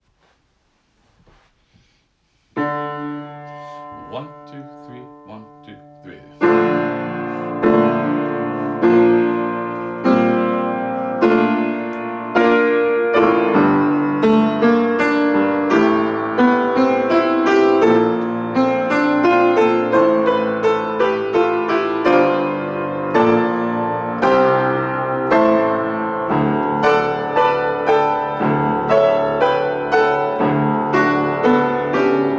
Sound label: choir audition backing track; Hunchback